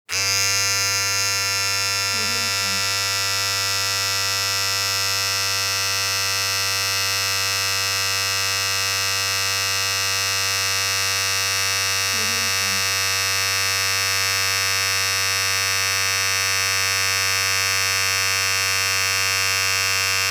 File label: hair trimmer; reanimateaudio